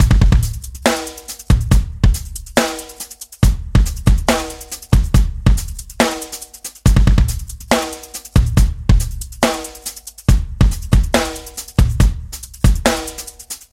HipHop Acoustic Drum

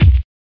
Hard House Kick

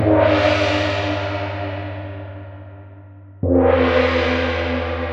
Gong Sounds

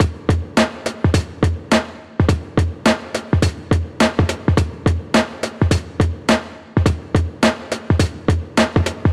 Old School Hip Hop

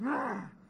Grunt Sound

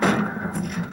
Metal Slamming

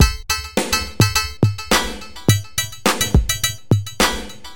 Old School Breaks

